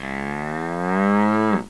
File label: cow moo